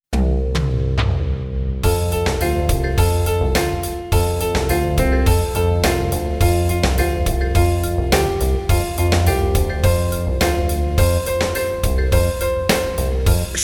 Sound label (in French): VERSION KARAOKE; Alex et Zoé 1